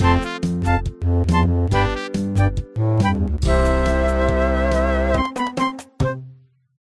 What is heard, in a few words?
Farming level up